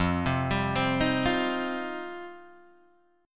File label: F 7th Suspended 2nd F Guitar Open D